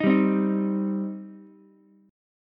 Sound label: G Suspended 4th Sharp 5th, Ukulele; strummed; 8,7,8,6; JGuitar